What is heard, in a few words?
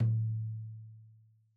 Tom2 Stereo, Deeply Sampled Drums